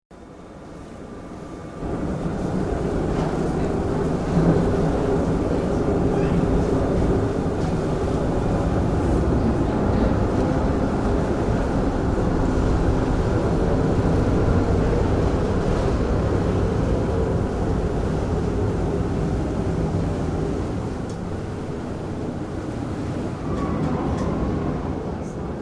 On board a Liverpool ferry arriving at destination; Ferry Journey (Docking); Boats (Sound Effects:Vehicles)